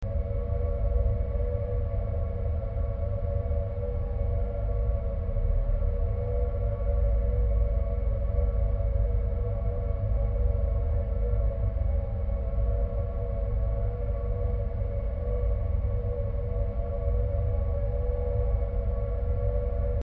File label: atmos eerie, SPÖKLIKT; MILJÖER; ATMOSFÄRER